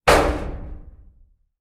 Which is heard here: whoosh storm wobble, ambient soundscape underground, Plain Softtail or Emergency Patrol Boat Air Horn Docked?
ambient soundscape underground